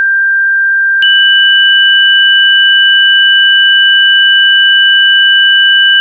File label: g3 f-sharp4